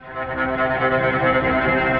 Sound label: Cello Tremolo; Instruments; Distressed Strings; Ableton; Mood Reel